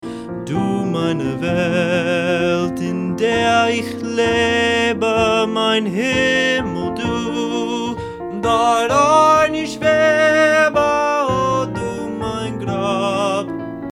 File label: Vocal Classical; Vocal Reel